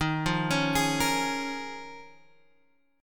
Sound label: D sharp 7th Suspended 2nd Suspended 4th, Guitar, Open F; D#7sus2sus4; arpeggiated